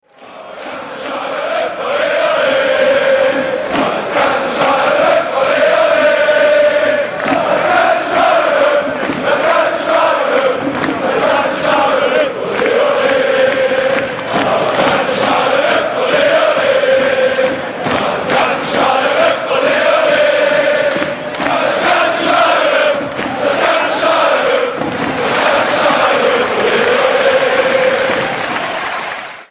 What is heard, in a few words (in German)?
das ganze stadion hupft, fan chants; bayer leverkusen